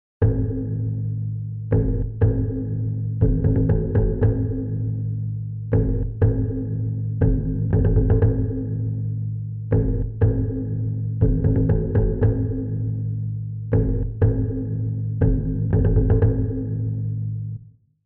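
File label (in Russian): тревожные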